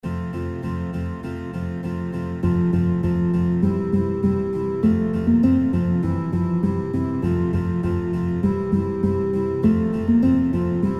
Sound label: Guitar Sur Le Pont d Avignon; Guitar Songbook; kinderlieder gitarre playalongs